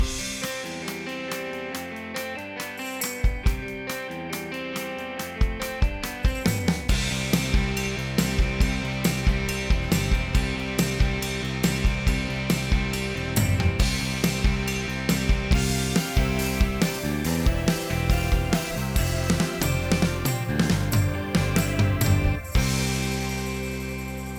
styles canadian rock; yamaha tyros 5

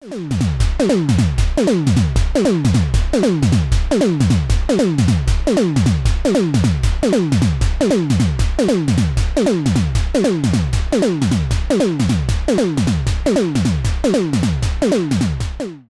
korg minilogue noisy sound

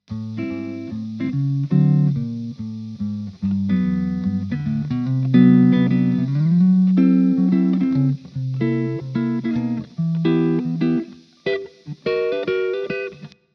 laroqua 212 progression open

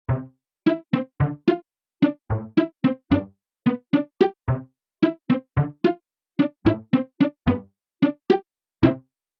Casio SA 80; Strings

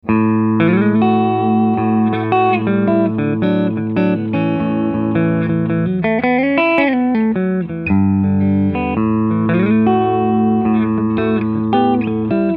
epiphone sheraton ii both clean

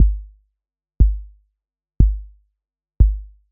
bass drum sub 51Hz; ableton live

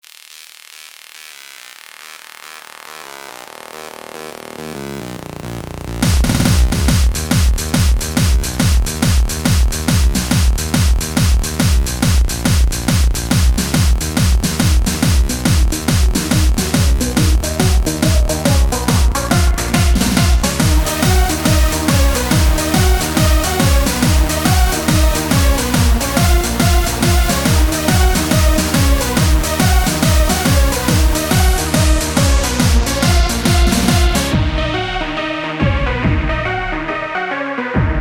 camel audio alchemy hard dance; 7 instanzen

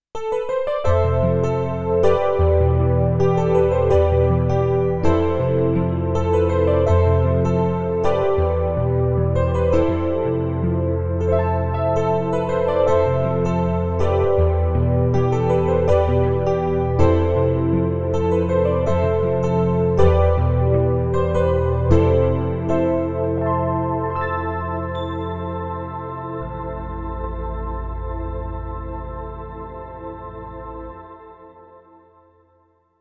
dx tine pad, kurzweil forte

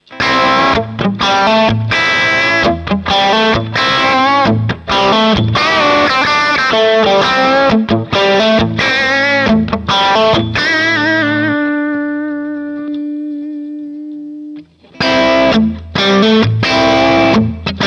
crunch

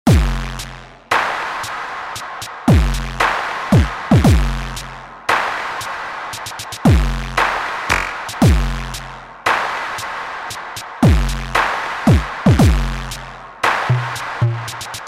arturia v collection 8 emulator ii v; bloodthirst

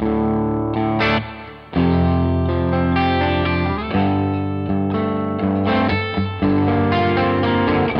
antelope audio edge note illinois57

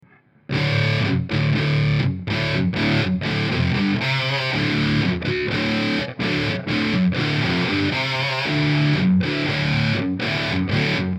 2 dubs; tc electronic mimiq doubler

